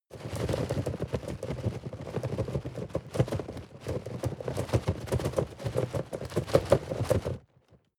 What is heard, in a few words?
cardboard box medium shake long; objekte